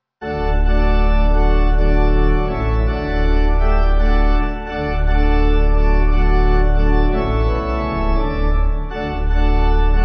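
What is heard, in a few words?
Pipe Organ, Music Backing Files for Churches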